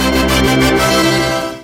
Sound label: Victory Sound